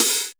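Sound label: tek 707 open hi hat; Drums In Your FACE!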